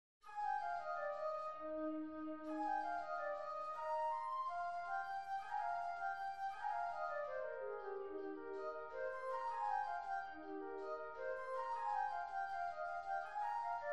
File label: Flute Track